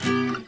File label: mellow guitar chord